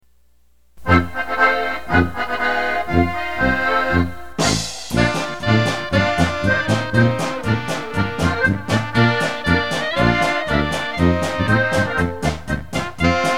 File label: German Band